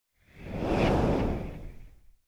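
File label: medium whoosh; truck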